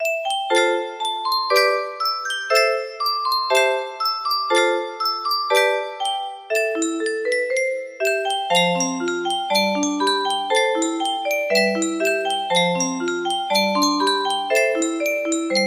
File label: melody